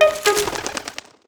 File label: mail sent; comms; Cosmic Rage